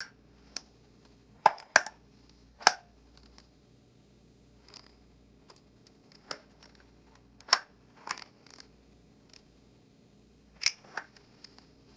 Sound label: handling a small plastic cup